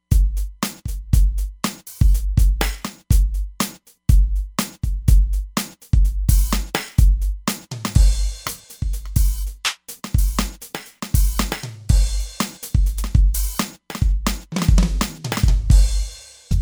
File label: Limiter Kit; Drums